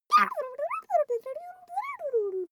child play murmur

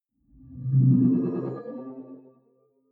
sci-fi display transmission damped

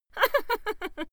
female laugh

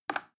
plastic button little release regular

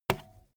bmx bike tire kick

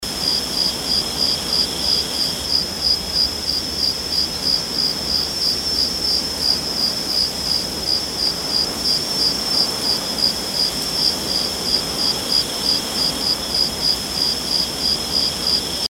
crickets atmo

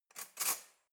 cutlery knife drawer grab hard

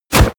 hit armor leather

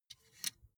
sunglasses metal side piece unfold

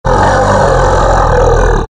danger growl

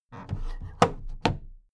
big plastic case put down

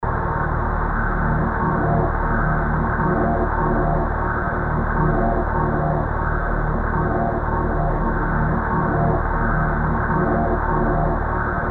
chord pad warm

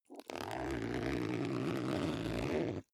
zipper backpack open slow

